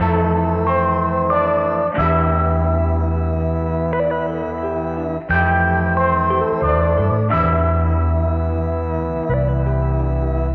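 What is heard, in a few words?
keys and guitar, phonky loops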